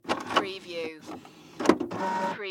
Fax Machine Turn On

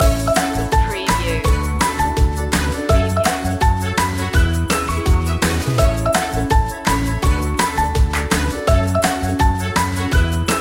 learning for life loop

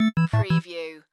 Casino Slot Machine Organ

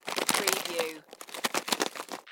Bag Plastic Shake; Foley; sound effects bible

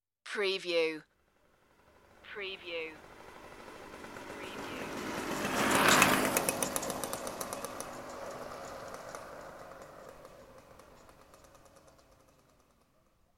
rusty motorbike passing

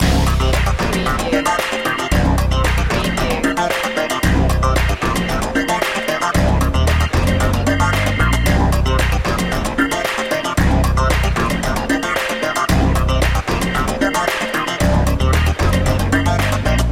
rhytmic soul loop

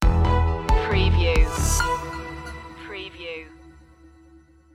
digital environment stinger

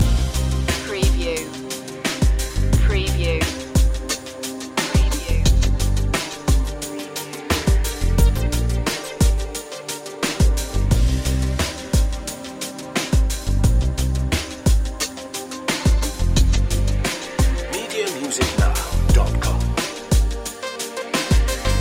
over distant shores loop

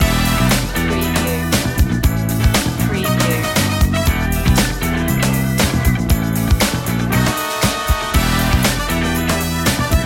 the funky one

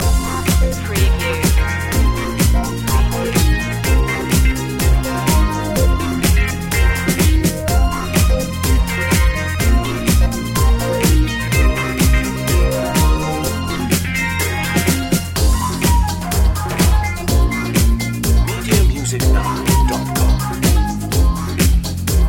hot steps loop

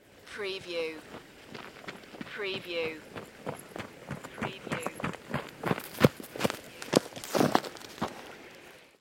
Run and stop on gravel near river

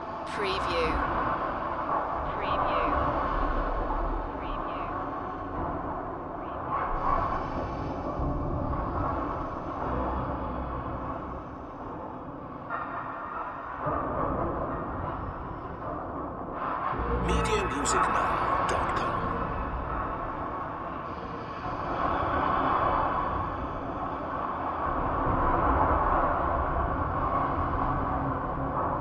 haunting underworld ambience loop

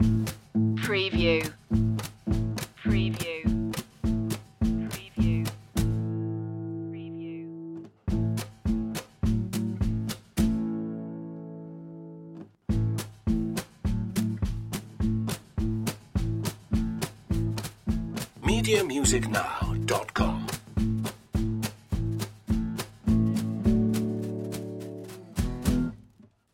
country boy breakfast loop

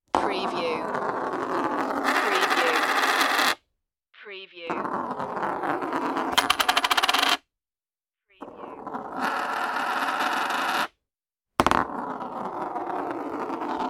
rolling coin

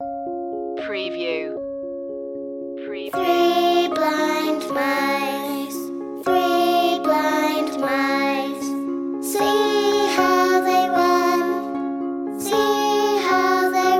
children vocals; three blind mice

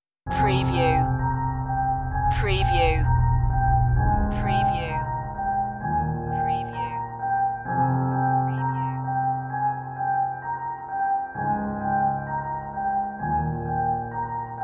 no static noise

